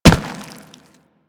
Infested Runner Step Default; Warframe

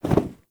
Vendor Leather Flap; Ambience; Soul Plane

